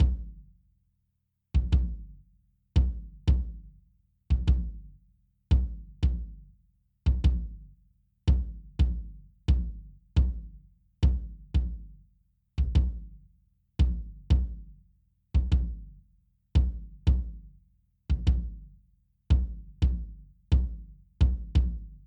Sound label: Bass Drum No EQ